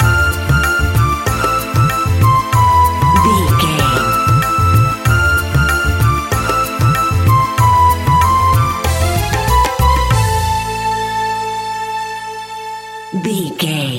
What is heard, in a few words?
Sri Lanka music, An exotic and colorful piece of World music. Groovy, powerful, exotic and wild.